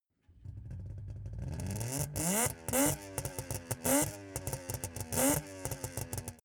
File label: car saab 92 startup blips exhaust